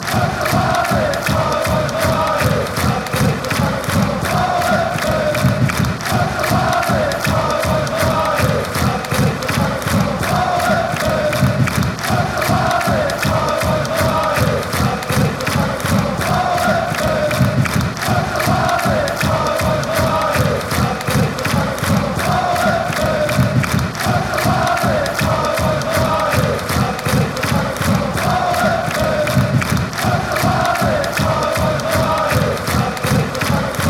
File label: champion, fanchants